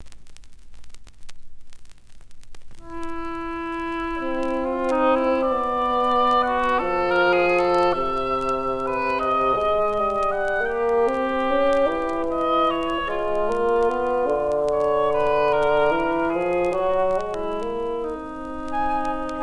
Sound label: Five Pieces For Winds Instruments & Percussion; Canzonetta